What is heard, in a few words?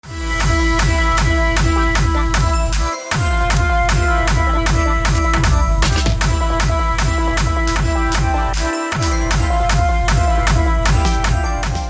hardstyle christmas ringtone